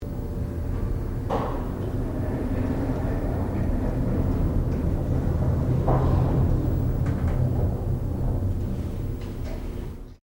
LIFT APARTMENTS LIFT TRAVELLING; Various lifts operating; Elevators (Sound Effects:Machines)

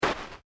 Footsteps Boots Sand Step; Footstep Foley; Footsteps (Sound Effects:Human)

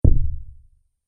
Bass Accent, beep; Object Sound Effects

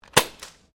GUN TOY DART PISTOL FIRING; Toy Guns; Toys

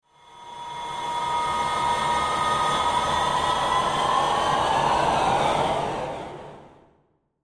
Sci Fi Engine Power Up; Spacecraft; Blastwave

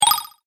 multimedia rollover, Multimedia Rollover Tone

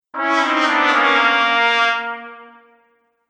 Cartoon Failure Trumpet; Cartoon Music

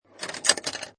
Pinball Sound Roll Past Metal Gate Or Lever; Video Games; Lynne Music

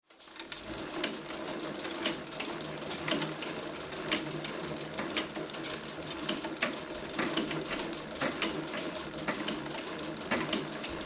Exercise Bike Elliptical Machine; Sports; Equipment

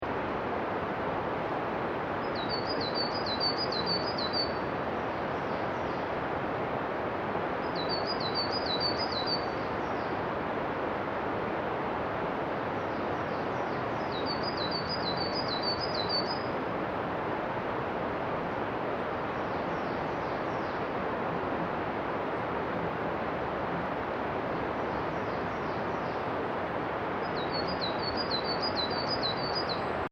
Ambience Akita Forest Birds Distant River Loop; Recorded in Golden Week in Japan 2008; Forests And Jungle

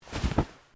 Clothing Shirt Cotton Move Quiet

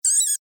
Insect Vocalization Small; Insect Voice (Sound Effects:Animals:Insects)

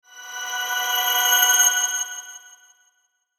Sweeper Crescendo Delay Ring High; Production Element Imaging Element Accent Transition

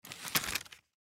Toy Package, Toy Package Cardboard Empty Pick Up; Action Figure Plastic Cardboard Small Empty Pick Up Wood; foley; Blastwave

